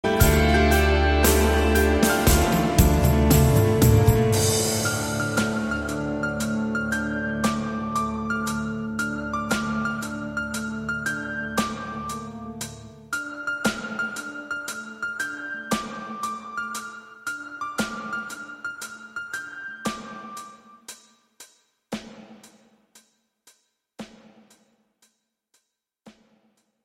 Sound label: piano